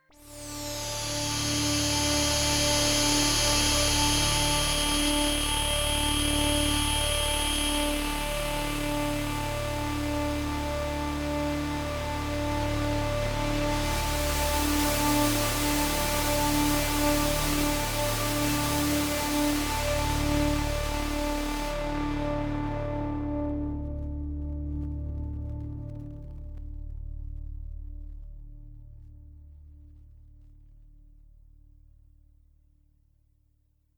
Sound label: D drone